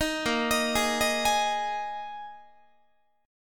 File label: A sharp 7th Suspended 4th, Guitar; arpeggiated; chordshape; 18,18,18,18,19,19